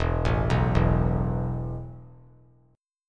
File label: E Suspended 4th E, down, 3C,3F,3A,3D; Esus4 arpeggiated